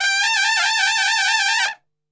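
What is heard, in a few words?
G trumpet shake; Best Service; Brass Super Section; TRP SHAKE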